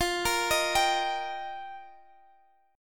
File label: Eb Major F